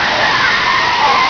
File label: ski; NewSurfs; PC Action 1998 December